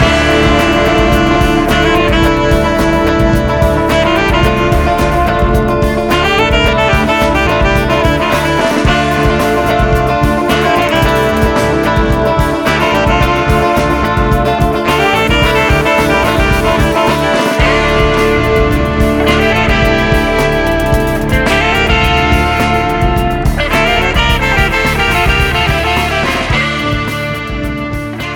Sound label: creep funk